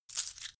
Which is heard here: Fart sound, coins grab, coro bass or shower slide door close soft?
coins grab